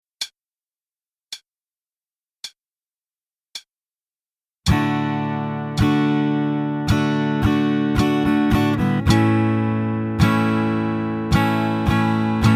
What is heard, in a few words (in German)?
Uebung, gitarre; Akkordwechseln und abwechslungsreichen Anschlagtechniken.; Garantiert Gitarre Lernen